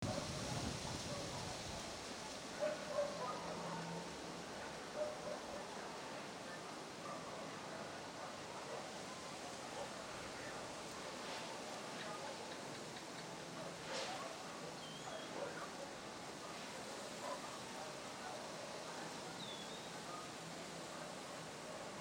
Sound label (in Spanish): Ambiente Calle